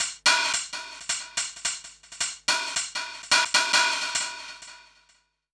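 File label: RAZE B hi-hat; Big Fish Audio; Uncivilised G'rooves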